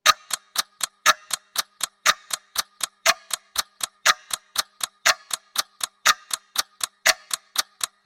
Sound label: ticking clock